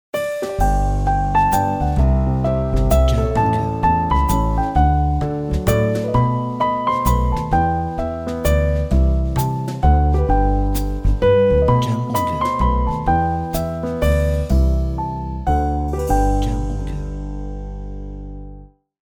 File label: Christmas Jazz Intro